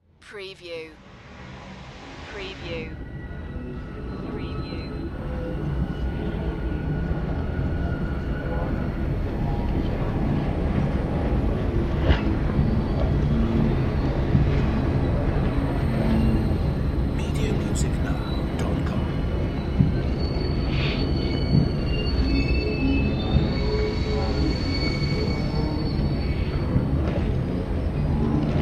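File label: Production Element Bed Demonic; Sound Effects Bible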